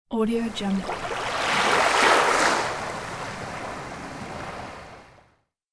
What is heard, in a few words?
small sea wave splash